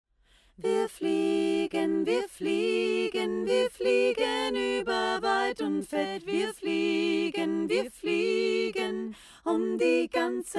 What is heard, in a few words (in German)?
Chorstimme accapella; Hexenflug; Die Musik-Insel; Alle Accapella